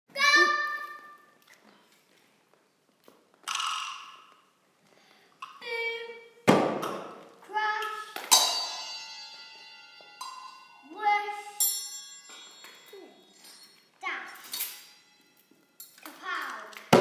Superhero sound effects, Onomatopoeia comic book sound effects with instruments